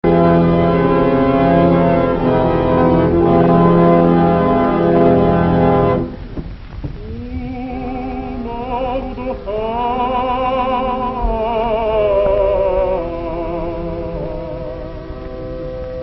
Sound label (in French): deux chants de pourim; leon algazi